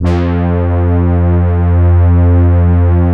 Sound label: SYN S Brass; Best Service Gigapack 1; SYN DIG4